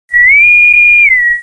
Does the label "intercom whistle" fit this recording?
Yes